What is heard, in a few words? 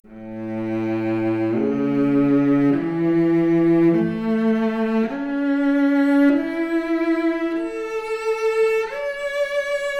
Violoncello arp, mp Stereo output, 4bit